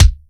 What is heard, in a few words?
soft hit whistle